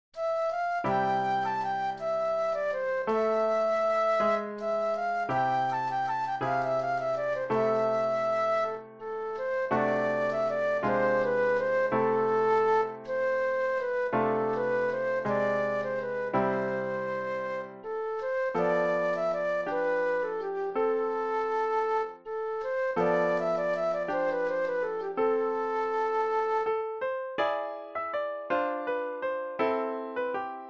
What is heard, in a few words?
Flute Solo; classical